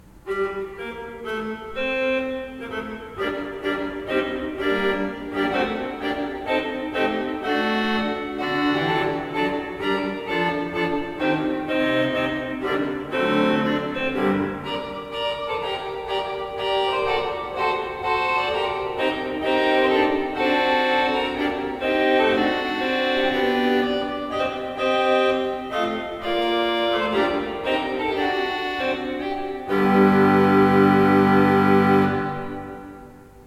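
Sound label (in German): Merkensteiner Orgelbau 19 II P, pfeifenorgeln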